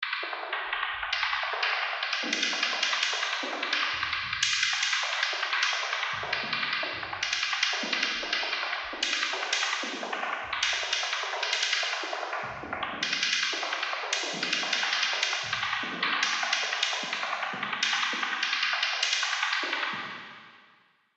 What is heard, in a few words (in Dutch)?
percussie